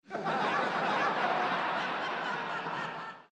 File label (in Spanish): risas